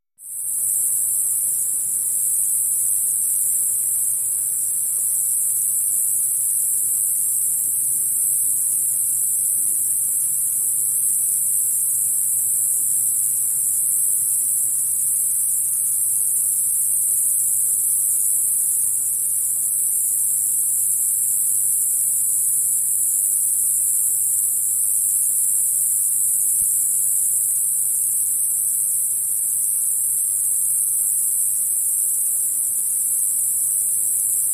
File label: Cicadas Ratchet; Cicadas Ratchet Stdy